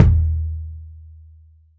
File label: low tom sample d sharp key, tom one shot tuned to the D# note. Loudest frequency: 152Hz; tom drum